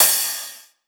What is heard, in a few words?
Cymbal Spikes, 909 Cymbals; Slate; Vintage Drum Machines Volume 3 909 Edition